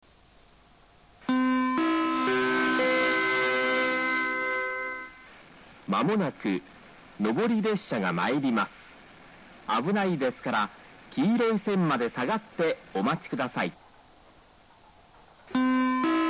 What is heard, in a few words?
nittaki 1 bannsenn sekkinn